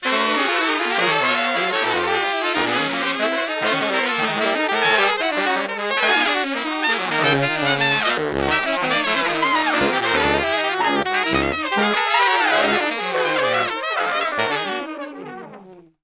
Horn Section Noise; Sonic Foundry (Sony Creative Software); Jazz Solos and Sections

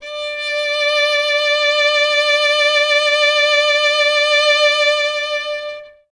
violin, Solo Instruments

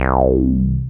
BAS, Eurotech; Sounds Good

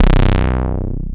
Basses; Zero-G; Total Drum Bass

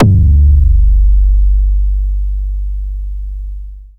Synther G, Basses; Total Drum&Bass

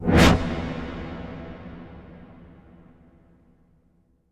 Impact Wipe, Cinematic

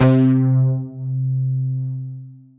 NOIZE BASS; Masterbits; Dance Trax

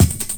beat rhythm, break beats

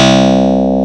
CLAV C2; Big Fish Audio; Synth City

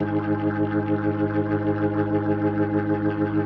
B3, First Call Vintage Keyboards

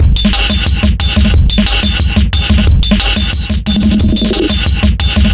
raggabeat 090bpm, Downbeat Dance Grooves; Zero-G

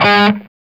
SOLDANO ROCK, Guitars; Spectrasonics; Hans Zimmer Guitars VOL-1

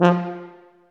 TUBA, Tuba stacc; Roland L-CDX-03 Disk 2

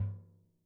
XKICKS, Living Drums; Sampleheads; Peter Erskine Living Drums

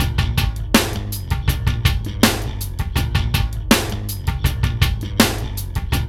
LOOP

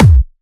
Clubby Kicks, Bassdrums

